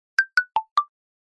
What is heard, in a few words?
Xyloid; Itel; Vision 1